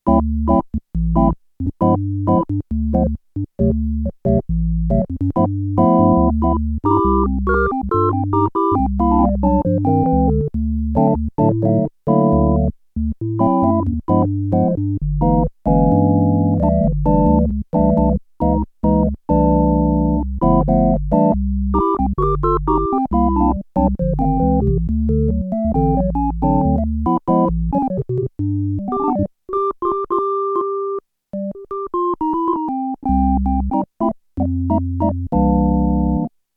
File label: ORGAN I, Korg DW-8000; Factory Patches